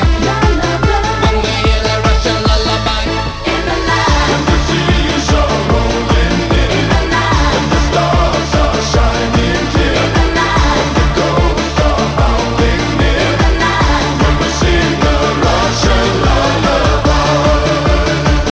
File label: balalayka